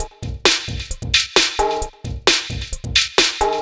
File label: No Kick Loop, Dance Beats